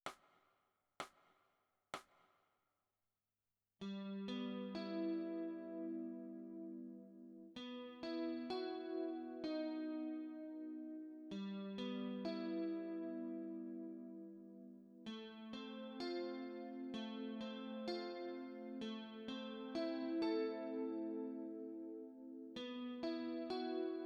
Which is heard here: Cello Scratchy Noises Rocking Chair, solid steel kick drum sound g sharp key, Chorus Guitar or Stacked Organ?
Chorus Guitar